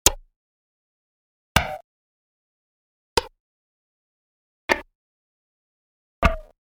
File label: 5 Sounds Production Elements, Electronic; Miscellaneous Electronic Sound Effects; Andrew Fleming